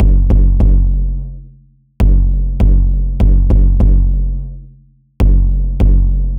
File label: Drum Loop 150 BPM Kick; Experimental Drum Loops; Loop Stems; Cymatics; Mystery Pack Vol 4; Arrival Drum Loop